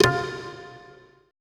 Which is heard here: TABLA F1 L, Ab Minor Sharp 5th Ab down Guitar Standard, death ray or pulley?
TABLA F1 L